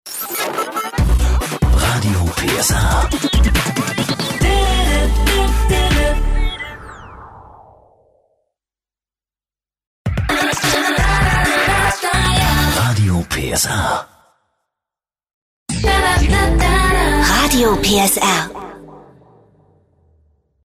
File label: Jingle; PSR